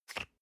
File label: toothpaste screw cup open medium single